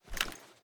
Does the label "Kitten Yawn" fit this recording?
No